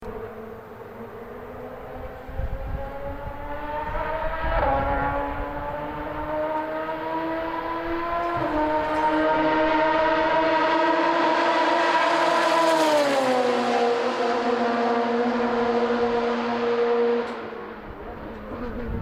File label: Porsche Rs Spyder